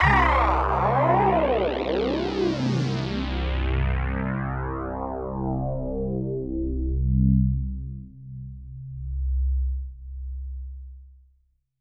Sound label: NL A4 C1, STRINGS; Music Store Sound Service; Einstein